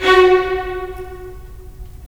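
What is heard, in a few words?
strings violins, left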